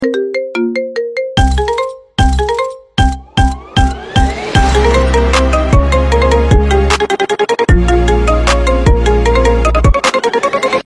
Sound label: iPhone Remix Ringtone